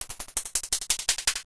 HIHAT, Drum Bass, 160; eJay

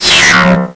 Zappo

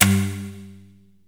mouse; Bombardier

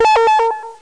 Can; Dino Crisis

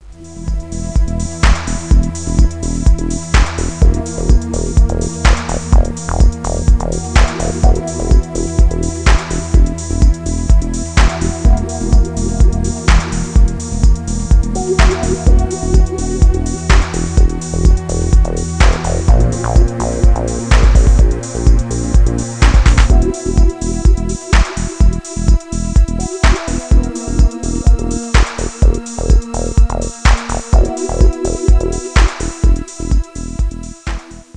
reentry; Gamezone

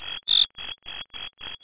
mushi; Internet 1996 World Exposition; Japan; NTT; winter

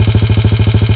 eng dec; RedLine demo

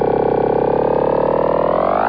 Wave Start; Grid Warrior

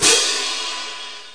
Cymbal "orch crash"; Indiana Jones Demo; iMuse Setups

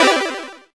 chords, Synth

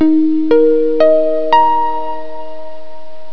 HARP UP; SysError

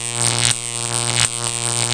zapper; duke nukem total meltdown

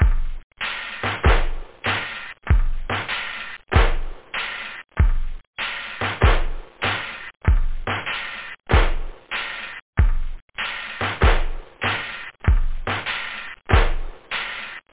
Disco; da capo vol1; loop house

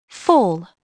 FALL; Dorling Kindersley My First Incredible Amazing Dictionary

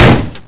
HIT POST

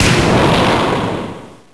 blast

